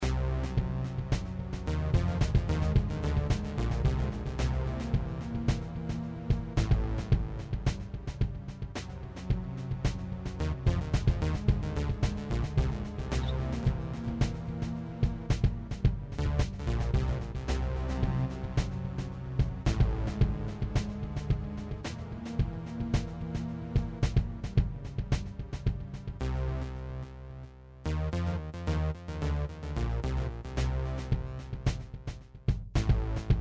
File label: electro like